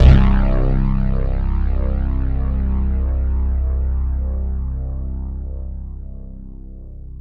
JV polysplit, JV-1080; Syntec; Wall of Sounds

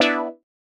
125 stab E, Keys, Nu Jazz House; PowerFX - Nu Jazz House

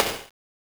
Smash SFX; Genesis

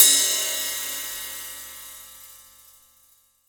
20 SIZ, 20INCH SAB RIDE

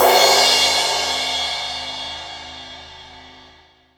crash; East Collexion; Drum 1 Dry

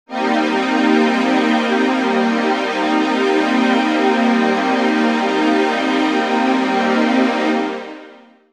Digi Pads; Jungle Frenzy 1

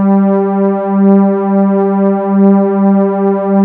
HOUSY, RAVE; Rubber Chicken Software Sample Bank

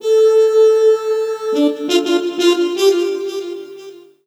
sax, Roots Funk; USB Soundscan vol.41; AKAI

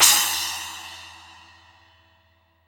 SPLASH, Drum; East Collexion; Drum 1 Dry

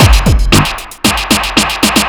Funky at 115 Fill, Drums Beats

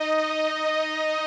505, String Machines

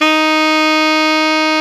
SAX XALTOS, Sax Sect.; Saxophones

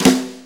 Brush Snare; Sonic Foundry (Sony Creative Software); Drum Tools; Brushes

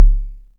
dry kicks; Quasimidi Rave-o-lution 309

